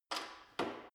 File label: gym bicycle saddle unlock soft; sport freizeit